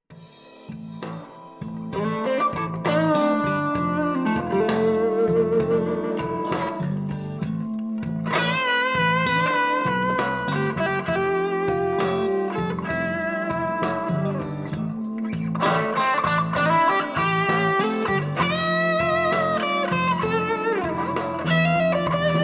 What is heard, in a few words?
blues, guitar